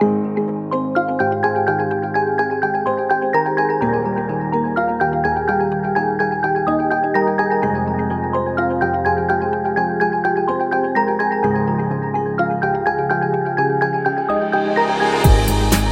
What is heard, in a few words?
Corporate Inspirational; conference